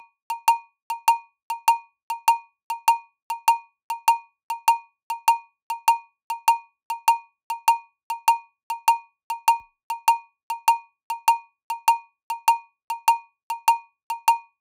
100bpm Percussion A-

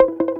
Guitar Dirty Picked

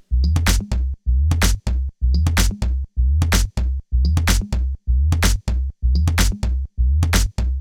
126 Full No Kick

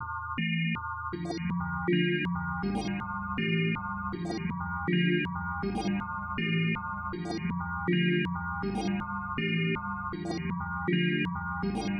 Melodic Sounds

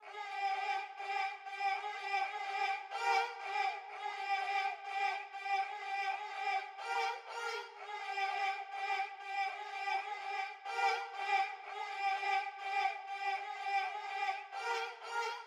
Ethnic Trap 124 Sarangi G min

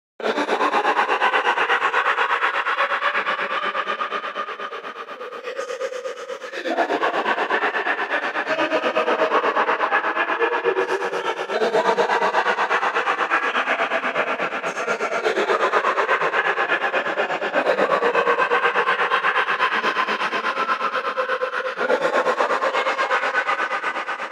124 vox

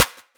snare clean

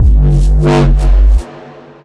bass one shot, D; bashey

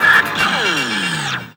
Shadow fx

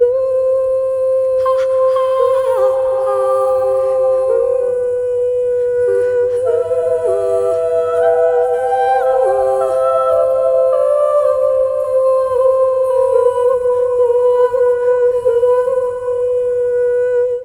110, vocal soprano uplift sweet reverb, C

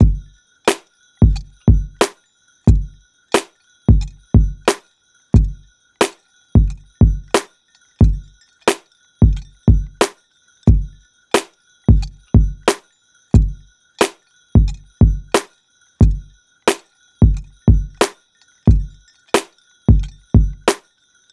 90 drum loop perc; agate

